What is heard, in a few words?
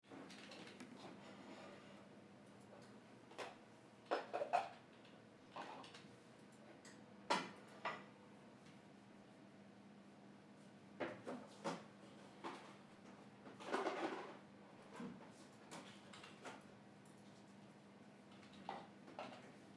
office newspaper coffee machine; atmosphere